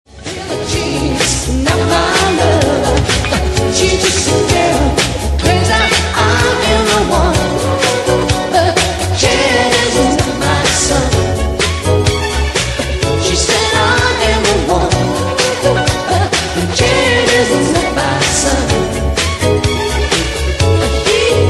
smooth & groove

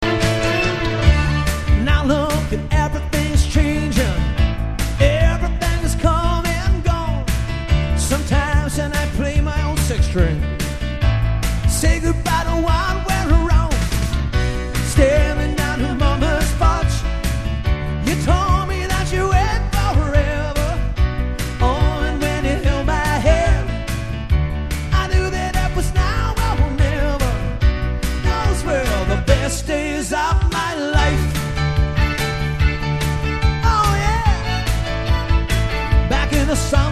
fiddle

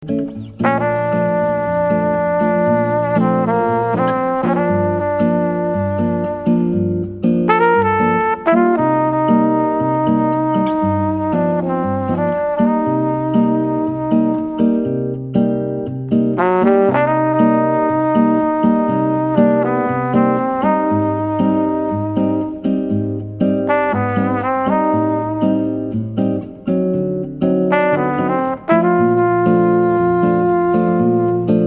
Brasilian duet

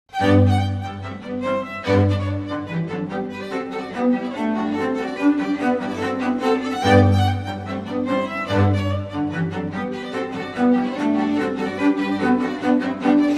Classical

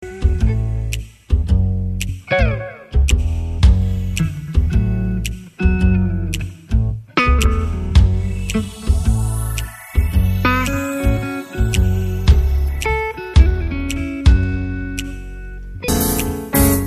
Jazz

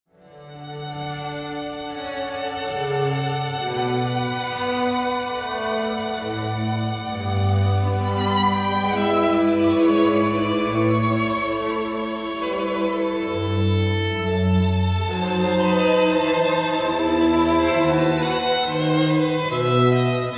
strings, Air from Suite 1 in D

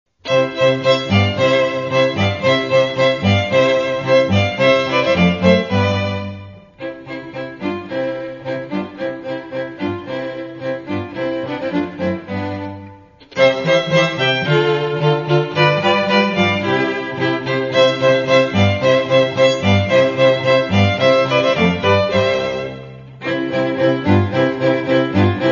Strings

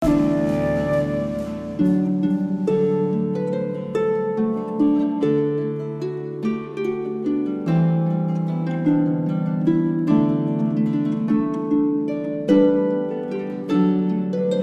Harp

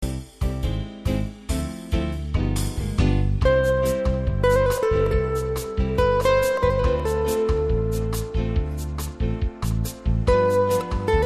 Latin Jazz

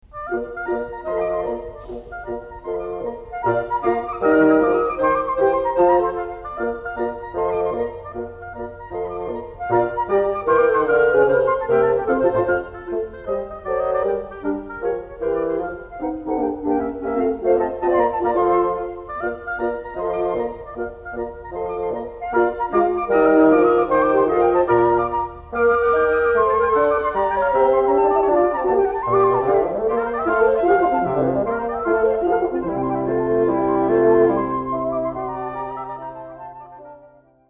Wind Quintet

